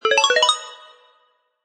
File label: level up; disney-junior; mickey-s-super-adventure